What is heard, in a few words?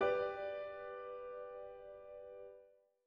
G maj triad